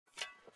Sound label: Corpse Shovel